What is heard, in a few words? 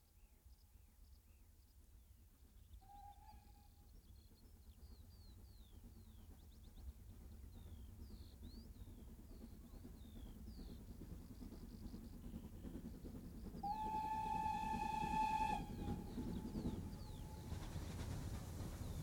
Steam Train coasts past at medium speed, right to left with whistle; BBC